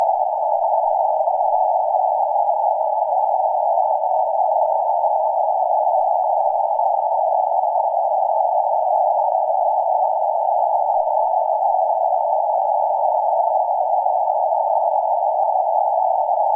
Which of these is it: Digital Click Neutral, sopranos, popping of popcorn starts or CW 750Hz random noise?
CW 750Hz random noise